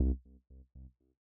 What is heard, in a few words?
Bass 2 C1, Belgium Beats 132bpm; Big Fish Audio - Old Skool House; OSH Construction Kits